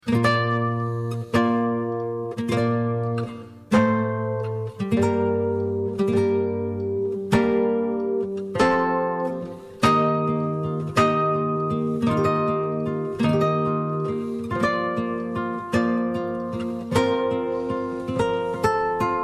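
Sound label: guitar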